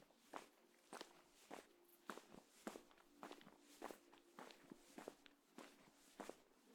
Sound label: Footsteps On Road